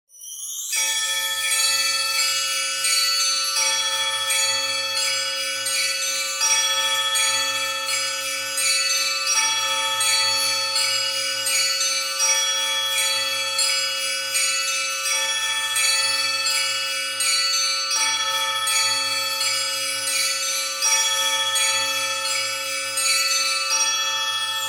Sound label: Christmas Bells Ringing; kingfisher christmas samples